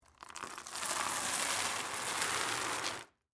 Chain Drag Wood, Industry, Chain, Drag, Across, Wood, Surface; Factories; BlastWave